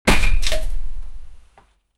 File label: sound of throwing waste; mobile game